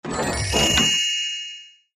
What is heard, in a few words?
mystery open; gift